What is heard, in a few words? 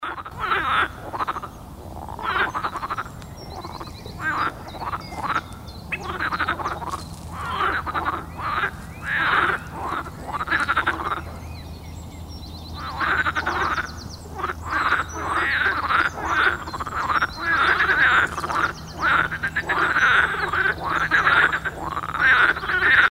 Duck Sounds